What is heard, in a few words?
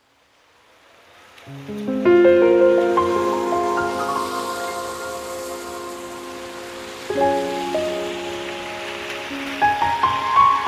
Piano and Rain